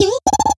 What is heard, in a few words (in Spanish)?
Grito de Timburr